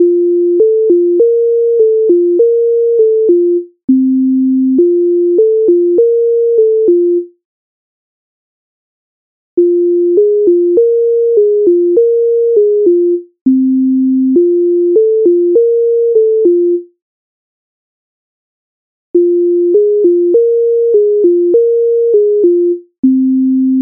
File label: MIDI